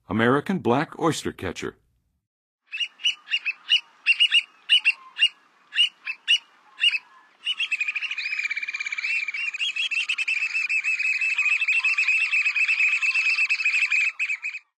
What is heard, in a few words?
American Black Oystercatcher, Birds of North America; Western USA; Western USA Songbirds